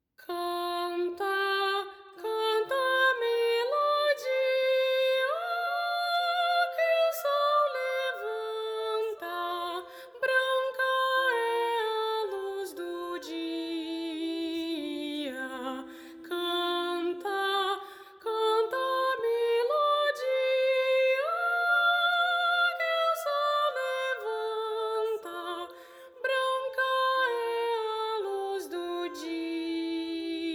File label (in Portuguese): Canon a duas vozes 1 VOZ GUIA; O Pequeno Cancioneiro; Arte de Toda Gente